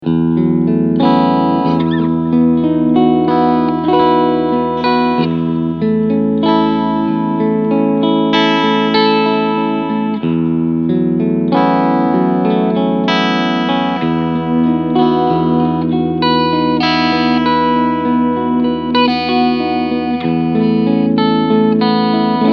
Chapman Guitars ML3 Pro Modern Semi Hollow Cleansound Front Humbucker; SC Modus